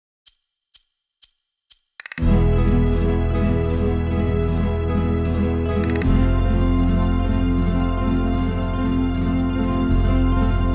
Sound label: Tango Flamenco